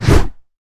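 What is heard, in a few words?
sword swing over head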